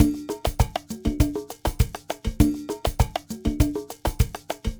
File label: Ensemble, Latin Percussion